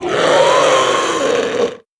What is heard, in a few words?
creature vocal